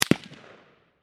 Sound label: Gun Machine Gun, Gun, Machine, Gun, .223, Caliber, Single, Shot, Distant, 100, Feet, Towards, Bushmaster, Ar-15; Warfare